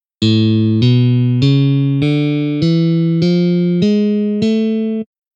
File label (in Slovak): Harmonicka dur od A nahor